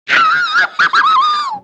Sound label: yell